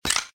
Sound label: Spin Stop; Pinata Loco